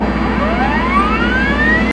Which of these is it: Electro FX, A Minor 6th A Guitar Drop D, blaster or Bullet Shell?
Electro FX